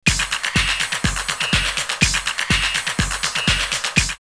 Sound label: Hound Clap 124, drumloops, Rave; X-Static Goldmine; 90 s Sample CDs